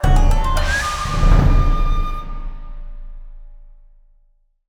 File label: Fanfares, victory; mk9 tym